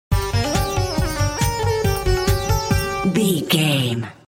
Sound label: egyptian video game level stinger